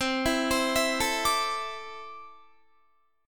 C 6th Add 9th C down Guitar; C6add9 arpeggiated

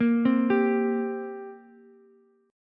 G Minor Double Flat 5th, down, Ukulele; arpeggiated; JGuitar

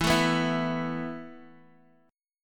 E Suspended 4th E, down

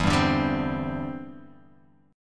E Minor 7th Sharp 5th, down; strummed

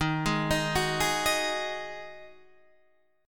Eb Minor Double Flat 5th Eb Guitar DADGAD

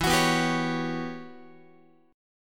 E Minor 6th Add 9th guitar chord; Em6add9 strummed; JGuitar

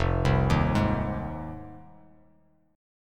F Minor 7th F; JGuitar

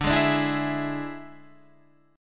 D Suspended 2nd Flat 5th D, chord

